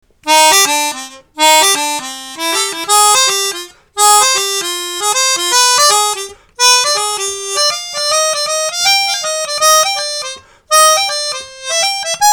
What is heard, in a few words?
clavietta